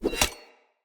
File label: Dagger Blade Flip Accent; Weapons; Player; Swords; Fey